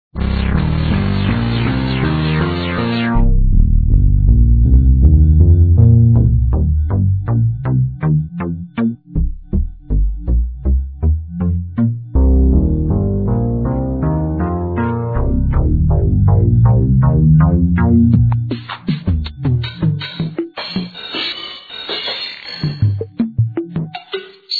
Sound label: drm n bas